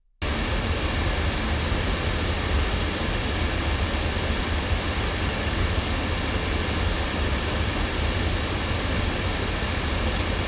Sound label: Sontronics STC-2 loudspeakers